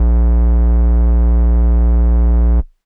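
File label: Air Bass Kawaii A#1; keys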